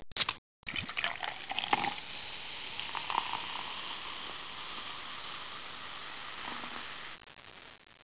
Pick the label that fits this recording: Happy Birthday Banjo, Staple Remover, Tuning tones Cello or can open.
can open